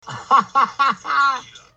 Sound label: haha